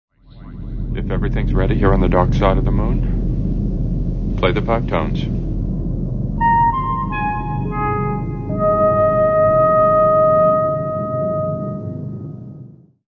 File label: Five Tones